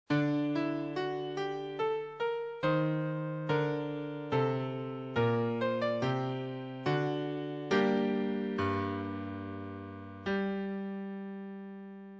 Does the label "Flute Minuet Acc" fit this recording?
Yes